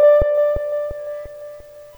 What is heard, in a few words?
a pluck loop